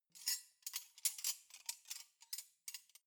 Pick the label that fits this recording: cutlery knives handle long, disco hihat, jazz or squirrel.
cutlery knives handle long